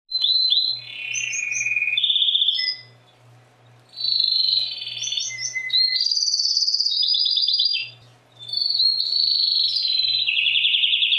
suara kenari parisian frill; omkicau

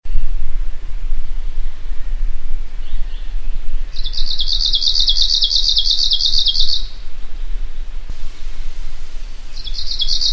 suara nyanyian burung cikrak kutub

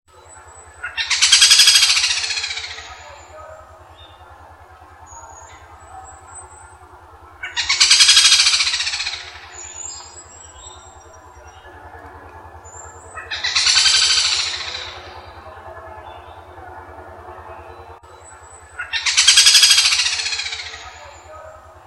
suara kicauan burung kadalan sulawesi

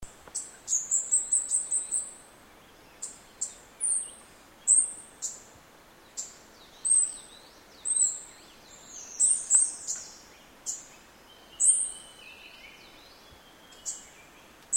suara burung cabai bunga api

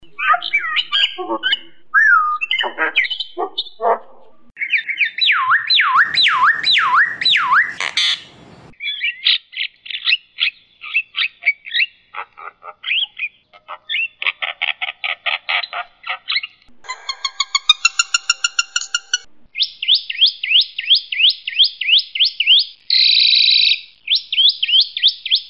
kombinasi jalak batam kebo hongkong

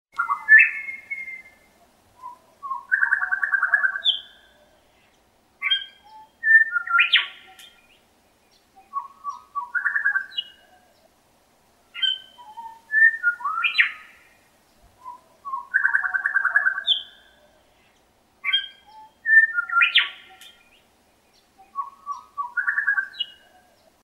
suara anis bentet kelabu

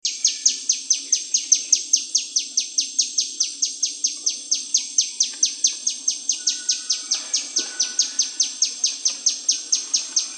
suara burungmadu rimba

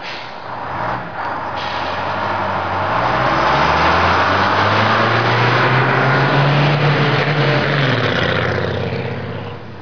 suara mobil

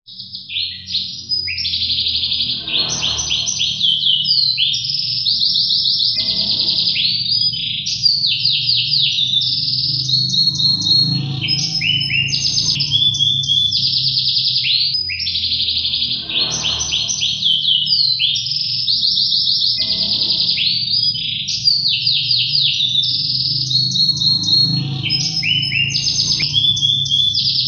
kenari gladiator modifikasi

